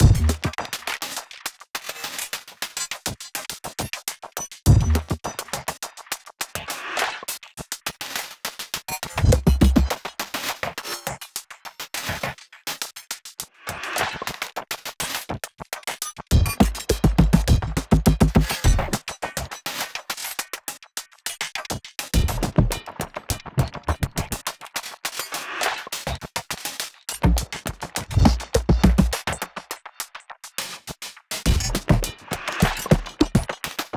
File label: music drums Fight; music ftue drumsFight 06